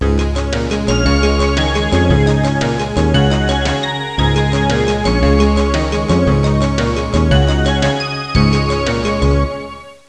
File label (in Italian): suonerie contemporanea; musica contemporary